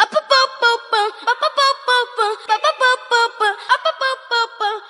voice a bum bum bum; Phonky

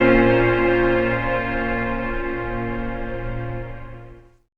chestra, String

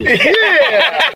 laughter evil group; ooh ahh

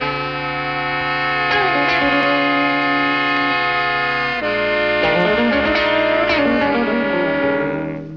gorns and guitar, Phonky Loops

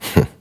"huh", voice; ooh ahh

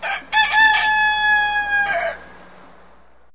chicken rooster crow; Animals

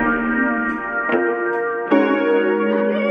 guitar folksie, loops; Phonky

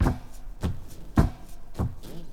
break down, drum loops

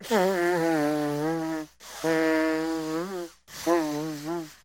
nose blow

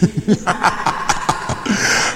laugh demon

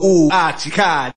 "ooh ahh cheekah", voice

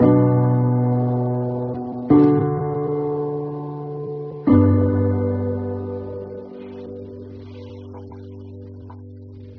piano warped, sad loop; loops

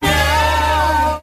"yeah", sung

